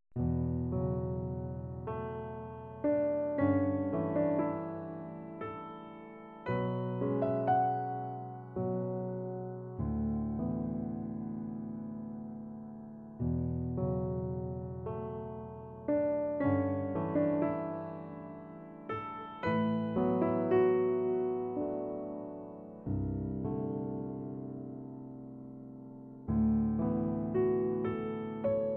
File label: Nocturne E Minor